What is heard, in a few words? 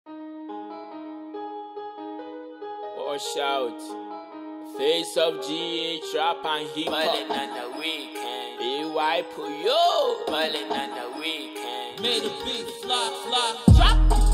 Rap